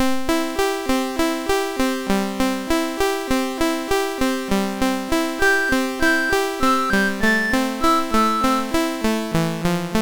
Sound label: Folk Song, MOS 6581 R3